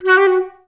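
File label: flutes F#3; Best Service; Peter Siedlaczek's Advanced Orchestra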